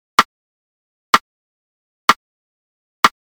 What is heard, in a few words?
Rim